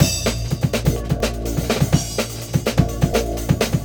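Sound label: kit and ambient synth; FRANTIC KIT; PROCESSED ACOUSTIC DRUM KIT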